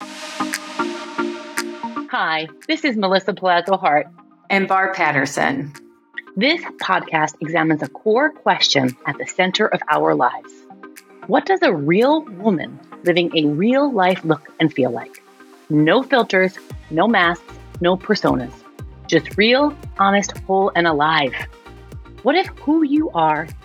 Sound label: Trailer Upbeat